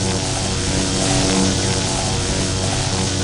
elec loop; minecraft; technic

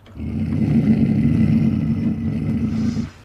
lion grunt; minecraft; technic

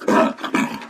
cub dying; minecraft; technic

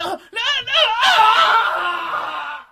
were transform; minecraft; technic

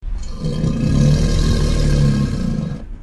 croc grunt; minecraft; technic

